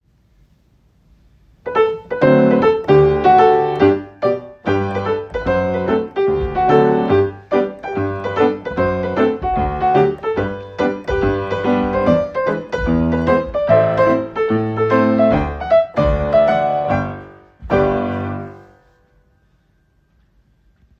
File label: Musica Petit Allegro